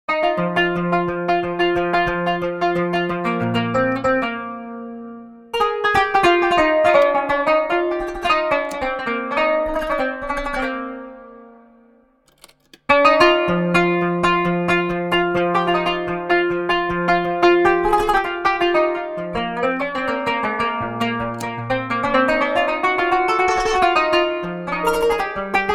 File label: Authentic Oriental Plucked String Instrument; Best Service; Quanun